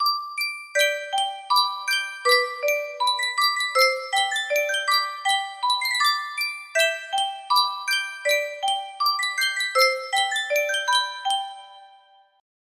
sankyo music box, melody

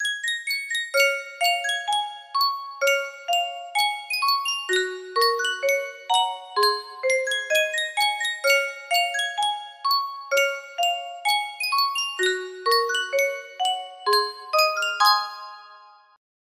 sankyo music box

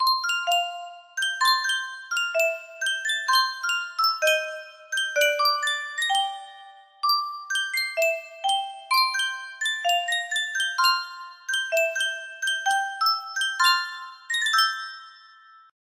sankyo music box

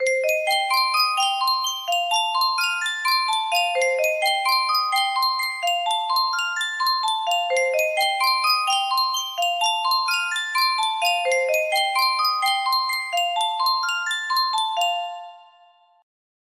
Sankyo custom tune music box

